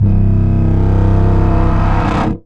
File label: C SPITS, HORROR; AKAI Anime Sound FX